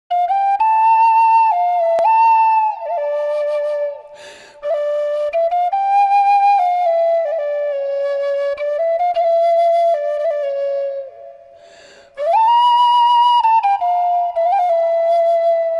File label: sopilka